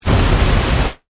Flame Hand Booster, Scifi, Flame, Hand, Booster, Long, Burst, Science, Fiction; Spacecraft